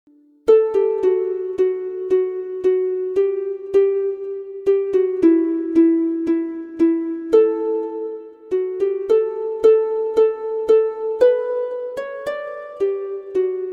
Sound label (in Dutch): Harp; Toontje Hoger